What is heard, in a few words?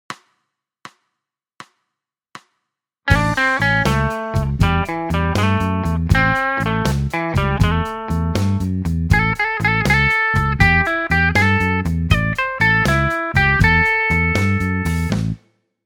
blues